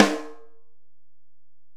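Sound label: Acoustic Snare, Alesis DM-PRO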